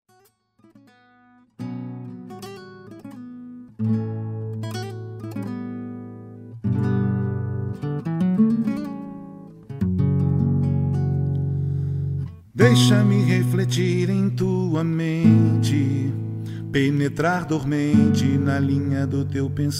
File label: viola